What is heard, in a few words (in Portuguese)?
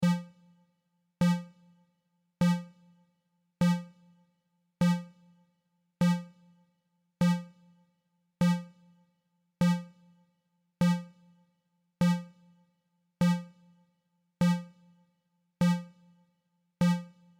amostra de pulsos com periodicidade